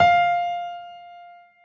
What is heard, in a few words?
drum hitwhistle; osu!